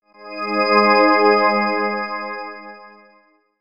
Tyrannosaurus Alert Arrival, phonetones, Sony Ericsson; G900; PlusFourSix; Lars Edvall